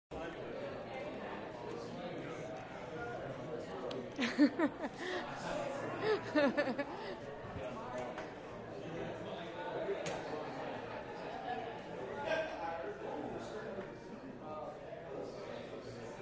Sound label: ambient crowd noise